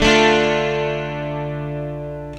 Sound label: GUITAR, ROCK POP; MAGIX